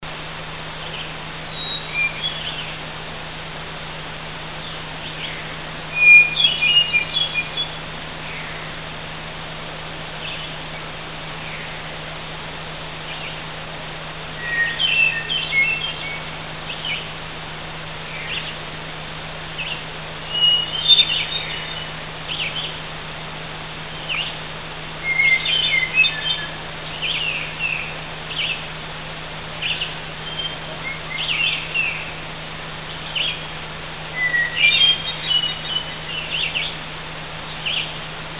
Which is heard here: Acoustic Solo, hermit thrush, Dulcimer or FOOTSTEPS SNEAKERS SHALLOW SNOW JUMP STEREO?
hermit thrush